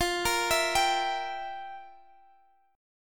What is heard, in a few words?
F Major 7th Suspended 2nd Suspended 4th, F, guitar